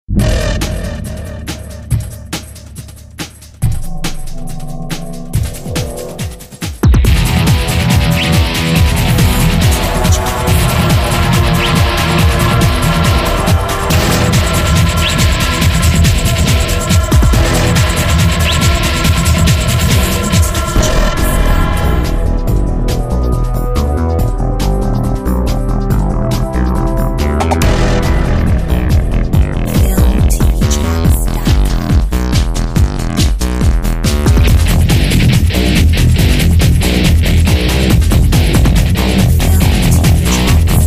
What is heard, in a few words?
rock